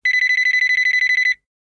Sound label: phone ring; grade5; english; expressing wants